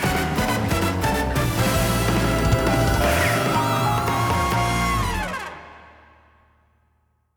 KARAOKE

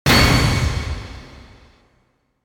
Thud

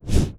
Transition Complex

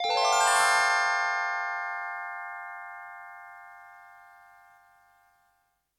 kira n

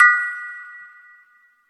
FLIP

